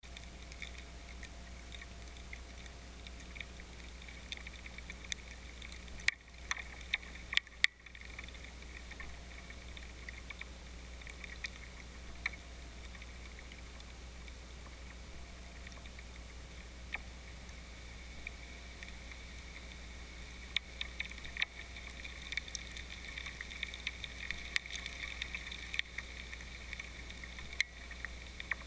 leaf slide; Aeolian; aeolian night

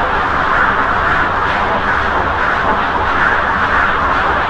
pitch noise, korg

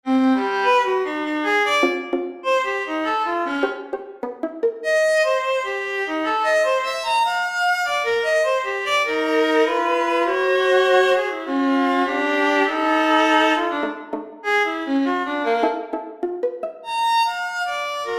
subharmonic

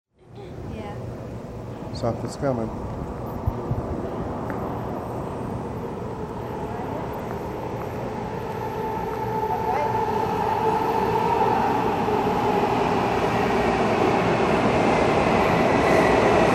Subway

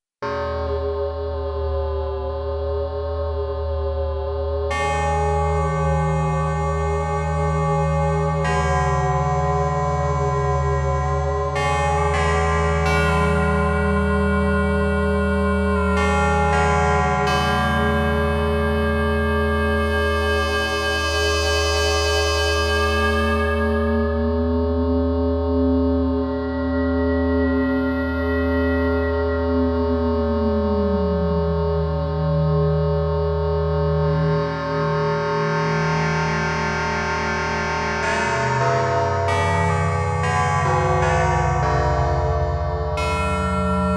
noise

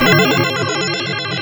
messed bells, korg

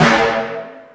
snare

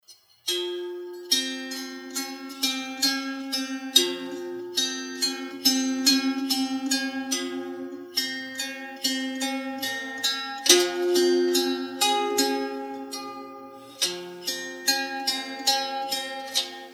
dulcimer

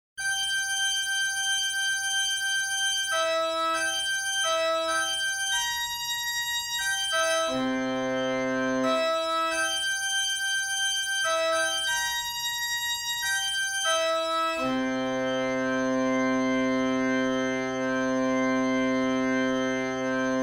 organ harmonic series

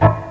noise

